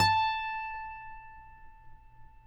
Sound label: Finger A 5 R, Guitars; Spectrasonics; Hans Zimmer Guitars VOL-1